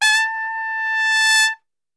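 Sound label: A 2 trumpet; Best Service; Brass Super Section; TRP SWL; 90 s Sample CDs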